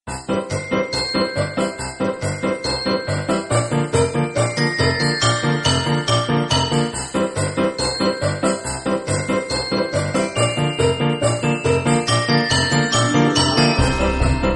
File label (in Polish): zabawa na sniegu utwor instrumentalny